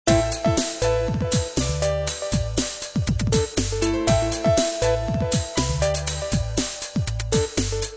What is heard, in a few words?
mellow breakbeat